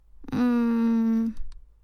hope noises

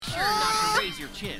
uuuu

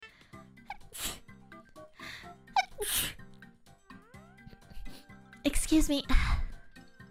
Sneeze

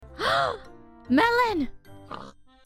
gasp melon

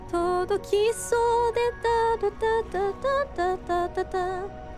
Singing; IRySinging

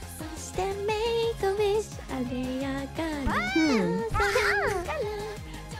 Eurobeat; IRyS

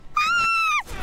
Scream AAAAAAA; IRyScream

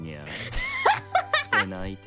Laugh; IRyS

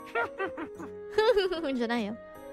witch laugh; IRyS; MC

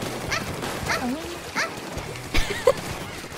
laughing; IRyS; Koyo's noises

